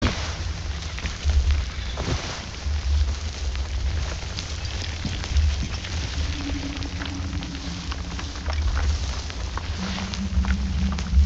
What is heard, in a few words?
sliding; kena bridge of spirits